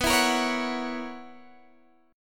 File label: B Major 7th Suspended 2nd Suspended 4th B Guitar Open G